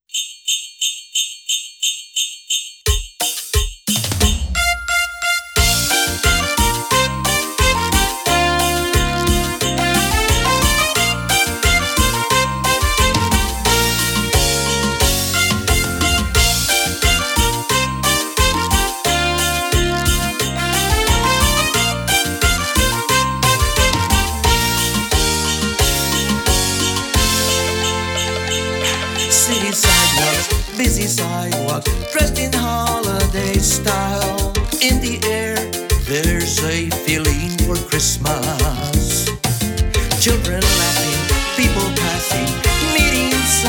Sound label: Christmas Cumbia